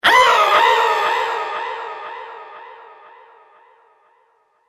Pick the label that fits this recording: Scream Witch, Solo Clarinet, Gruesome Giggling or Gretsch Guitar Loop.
Scream Witch